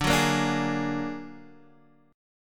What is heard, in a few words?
A sharp Augmented 7th D, guitar